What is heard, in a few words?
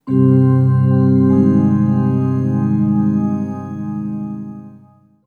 Pitch Vibe, vibes; Sonic Foundry (Sony Creative Software); James Johnson Spektral Minimalism; Sublime Keys